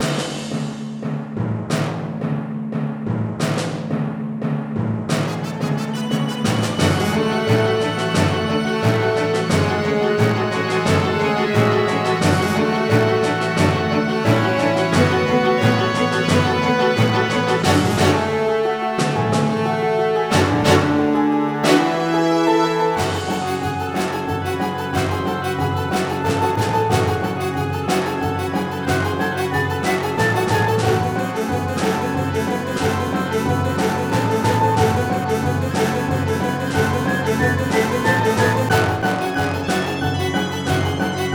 Siren Multiball, pinball

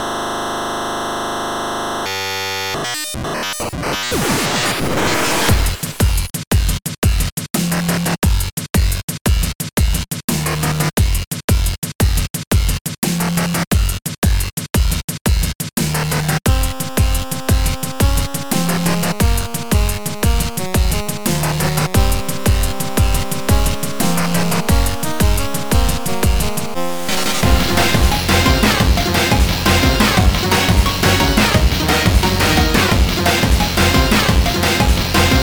Instrumental; Wrong Warp; Friday Night Funkin' Super Mario Bros. Funk Mix OST PC gamerip

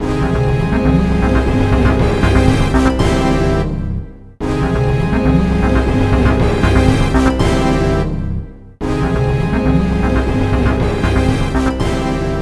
Jingle ~ World Completed; Adventures of Lomax

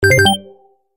Interface Continue

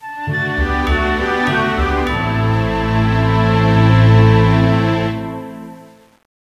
Fanfare - Draw; Yu-Gi-Oh! World Championship 2007; DS

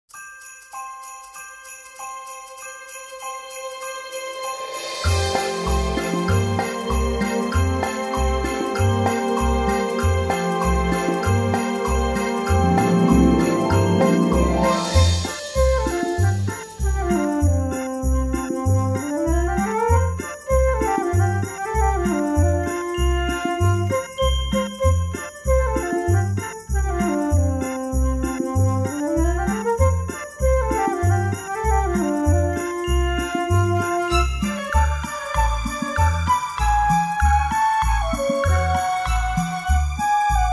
Sleigh Bells Festival; Gurando no Mori

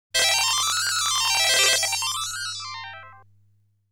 Dragon Jump

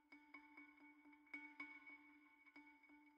Balafon

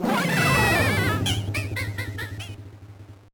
Failure; Wario Land IV

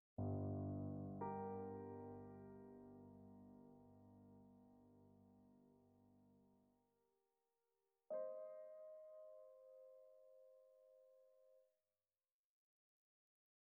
Music; Lucius III; Lucius3

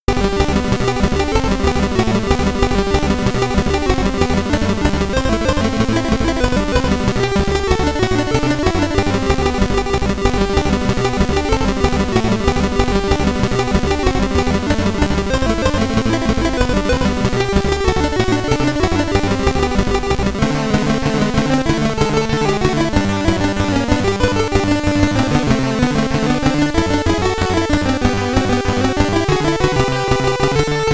SID Stereo, commodore 64; Athena; Forest World

